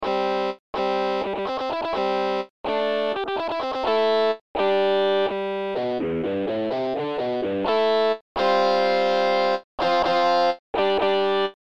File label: Rythm Exercices